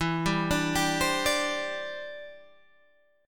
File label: E Minor 7th Sharp 5th, down, Guitar, Standard; arpeggiated